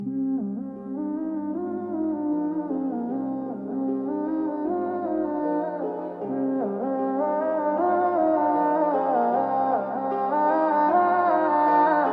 Remix Ringtone

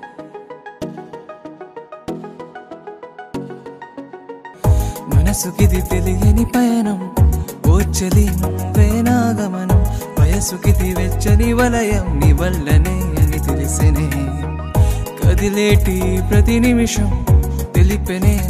Love Song Ringtone; RRR

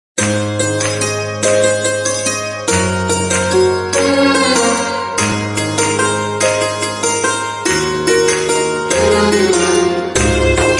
Instrumental Ringtone

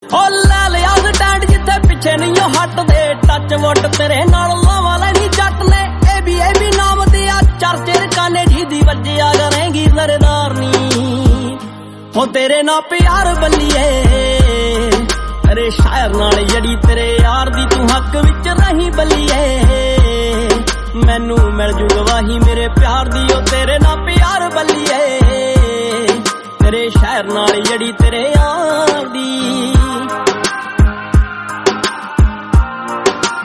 Punjabi Song

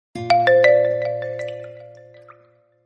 vivo elegant ringtone

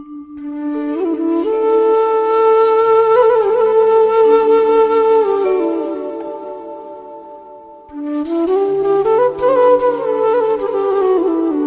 Very Sad music Ringtone

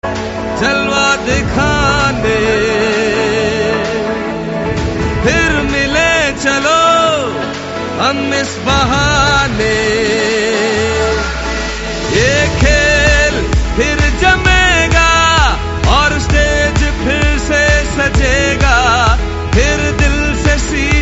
Song Ringtone

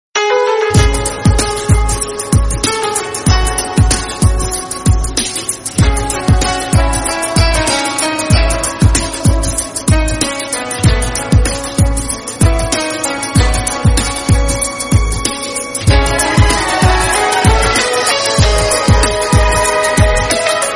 Bollywood Instrumental Ringtones

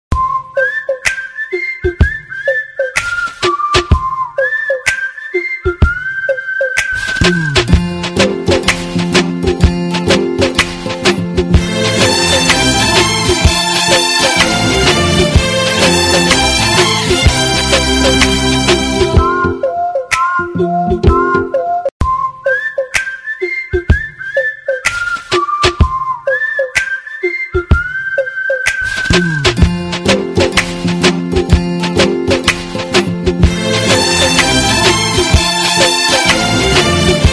Bgm Ringtone

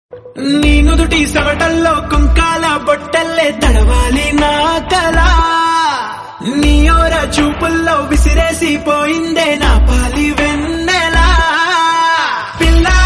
Telugu Ringtone

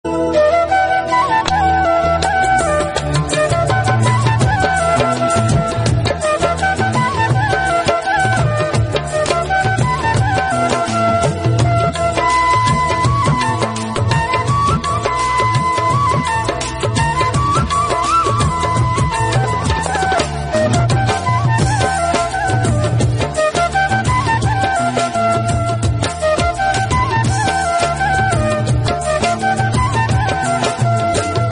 Instrumental Ringtone

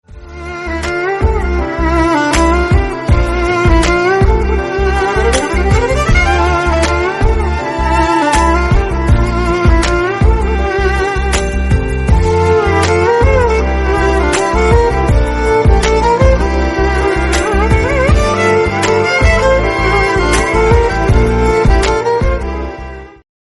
Very Sad Instrumental Ringtone

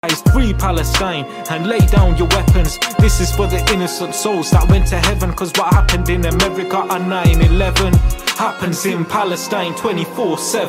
ringtone instrumental; all eyes on rafah free palestine

